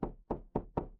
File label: door knock